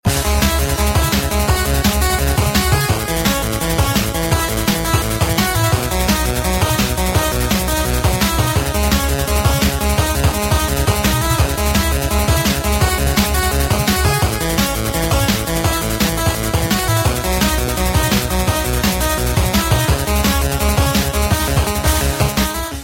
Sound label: 8-bit